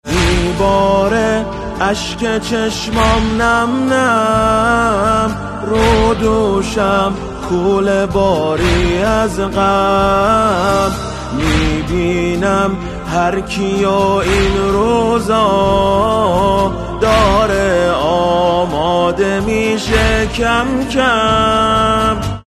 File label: ringtone